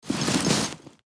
Full backpack adjustment noise

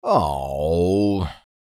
Disappointed male reaction

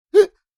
Human surprised sigh gasp and inhale

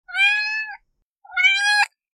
Soft cute cat meow meowing; animal

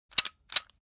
Pistol kimber 1911 slide rack

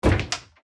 Footsteps on metal ship deck single step

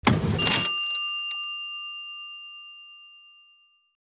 Cash register open ding; shopping

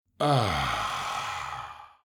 Sexy moan old man

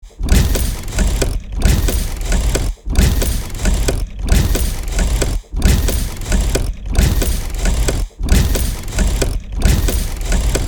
Hydraulic pressing machine loop

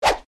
Very fast swish

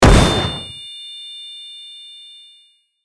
Flashbang explosion silent ring; bomb

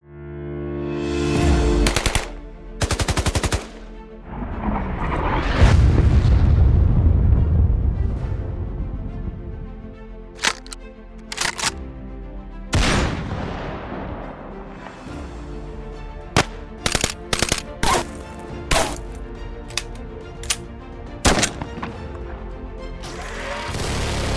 guns bundle

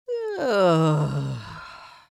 Witch fantasy vocalization yawn

